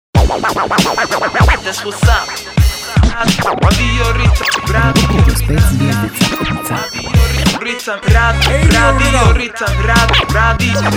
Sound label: Radio Jingle